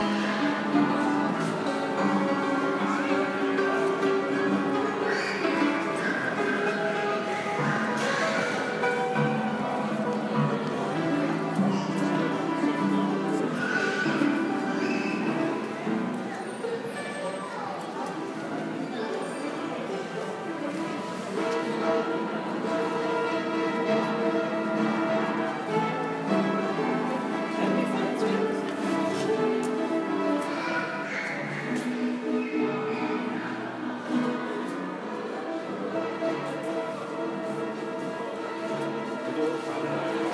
nicely out of tune piano, chalet du mont royal montreal quebec canada